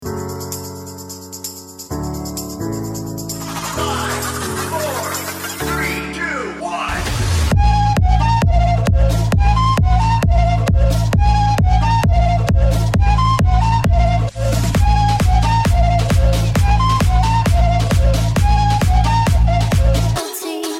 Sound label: disco 132 bpm; Clipdance disco mini kids